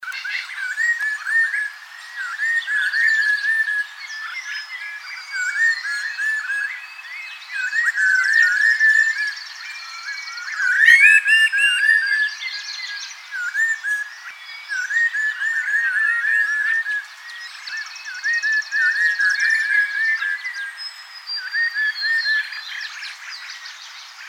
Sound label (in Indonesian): samho